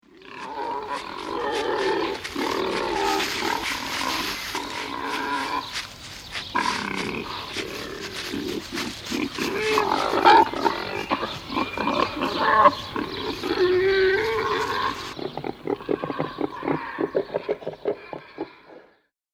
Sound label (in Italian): cinghiale, Mammiferi; Monte Pisano; Animali; I Tesori del Monte Pisano - I Volume Gli Animali